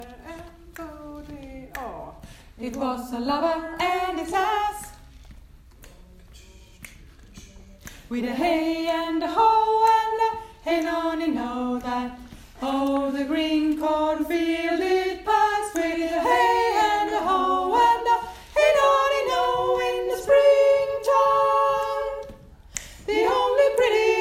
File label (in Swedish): Sopran; Första versen sopran (rep); It was a lover and his lass